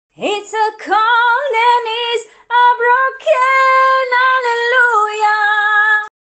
Crying female

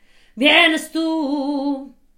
Rattle

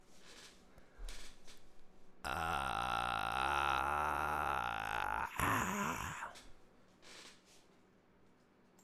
Vocal fry

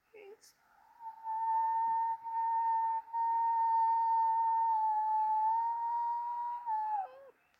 Whistle voice

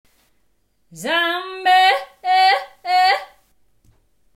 Yodel

